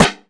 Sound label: natural steel snare drum sound c sharp key